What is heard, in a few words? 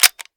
switch, m16; guns; sven; cof